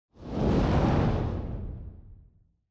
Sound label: Camera Motion; シーン・カメラモーション